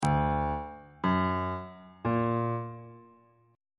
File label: c flat melodic minor chord 3 is e double flat augmented